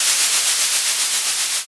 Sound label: balloon inflate